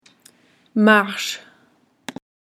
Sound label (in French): Marche